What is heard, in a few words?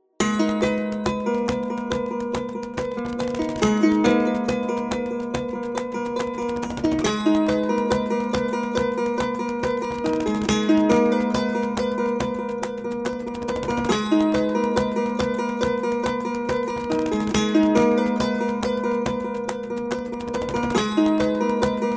String Loops Ronroco; World